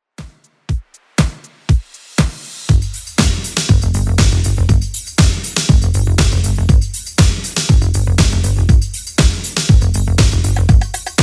80s Redrum 120bpm

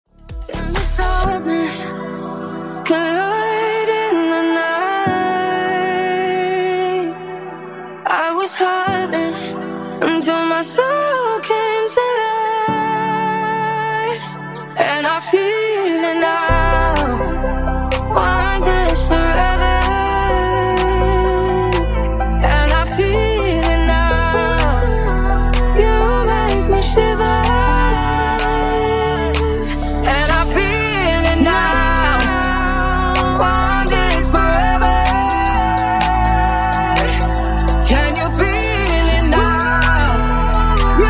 Funk 126 Bpm

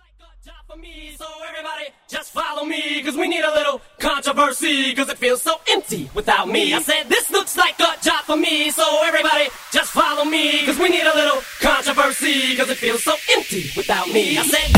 Acapella Intro

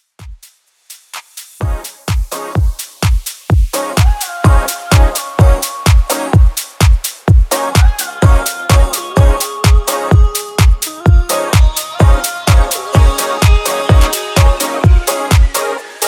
Latin Dance Top40, 127 BPM

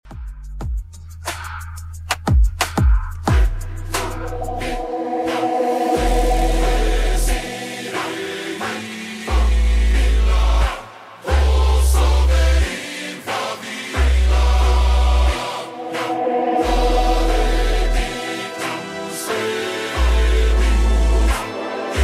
Top40 Redrum 90bpm

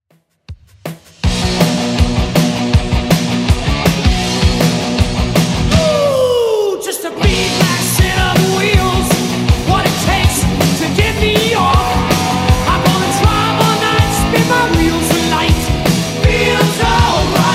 80s Redrum, 160 bpm

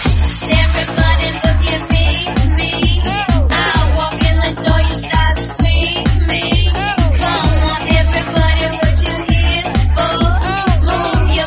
130bpm Bbm

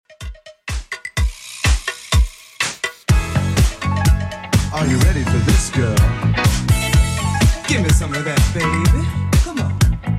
80s Redrum 125bpm

